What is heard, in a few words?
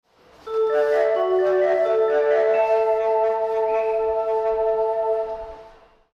yotsubashi melody; osaka